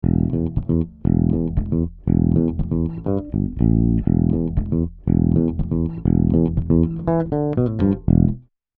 Summit Audio EQP 200B E Bass mit EQP 200B

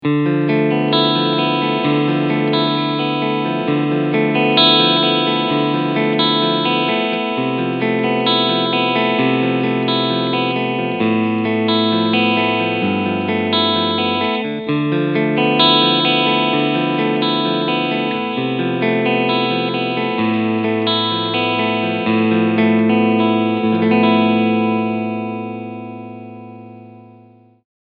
Clean beide PUs

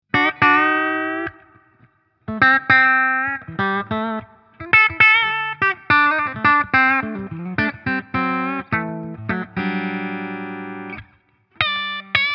Steg Humbucker clean; GL Tribute Asat Deluxe

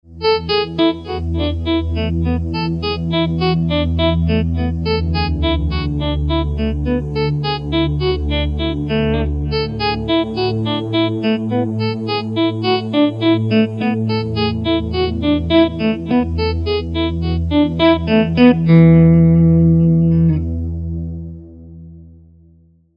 Variation E Myxolydisch

